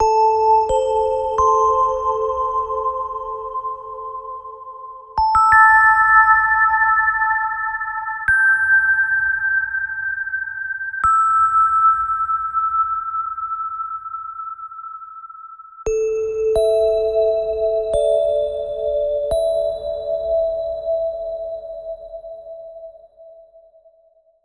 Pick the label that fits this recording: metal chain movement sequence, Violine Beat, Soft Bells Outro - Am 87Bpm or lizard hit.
Soft Bells Outro - Am 87Bpm